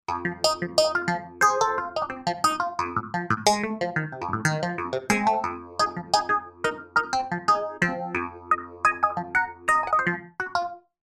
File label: Snappy Clav